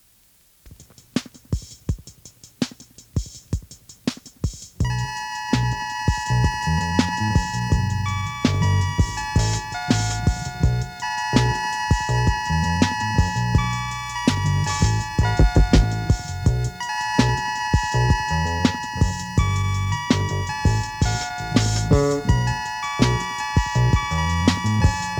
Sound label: instrumental